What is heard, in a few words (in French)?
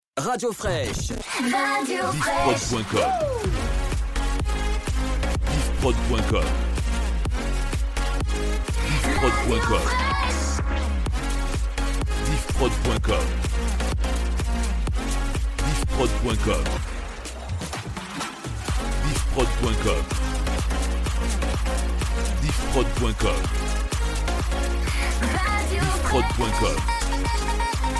Rampe; Breakout